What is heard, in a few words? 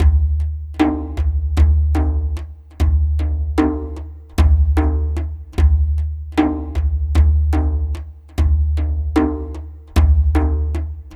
Dumbek Slow, Ethnic Percussion; Sonic Foundry (Sony Creative Software); Ambient Atmospheres & Rhythms